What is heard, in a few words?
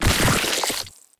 zombie beat